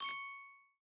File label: Notification